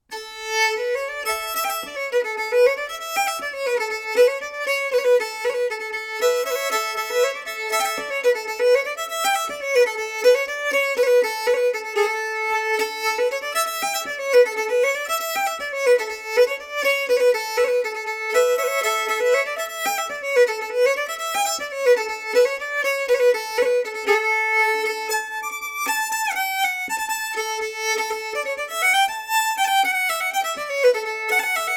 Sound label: Polska; Ooparin